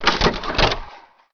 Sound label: infantry deploy; kindjal; Emperor - Battle for Dune